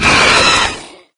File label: attack; doom3